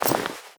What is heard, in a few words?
foley step; npc